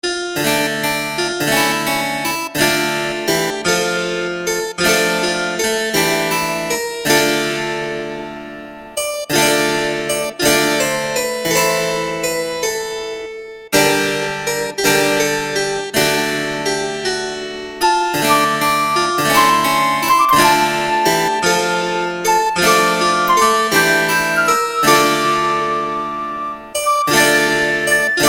Harpsichord Strings Flute; RetroMagix Harpsichord; DAL Flute; Syntheway

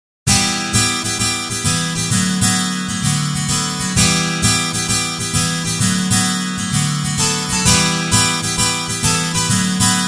Acoustic Twelve String Guitar; GuitarTempus; Syntheway

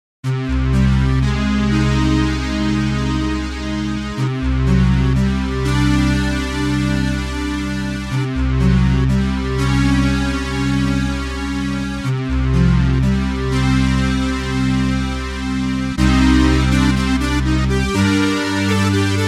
Oberheim OB-X String; Synthphonica; Syntheway

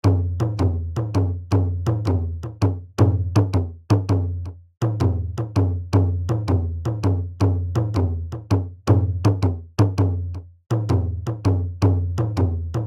Indian Percussion Pakhawaja Pakhavaj Mridang; Sitarkha; Syntheway

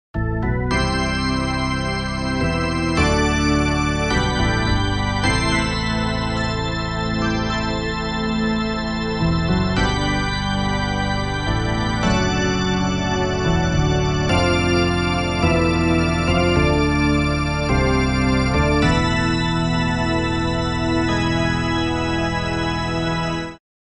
Synthesizer Organ; Organux; Syntheway

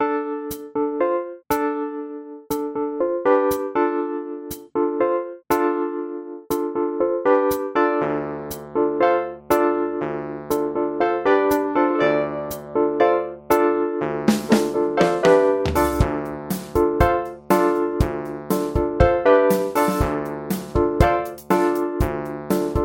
Wurlitzer 200A Electric Piano; ElectriKeys; Syntheway

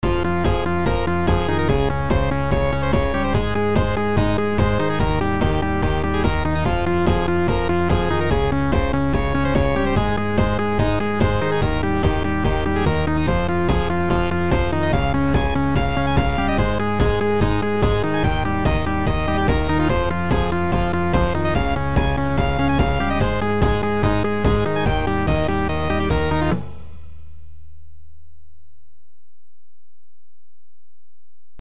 Percussion Kit; Zephyrus; Syntheway

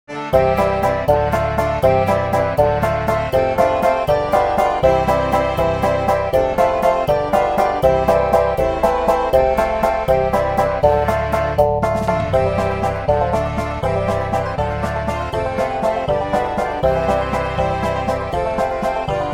Traditional European Ballads, Banjo; Banjodoline; Syntheway

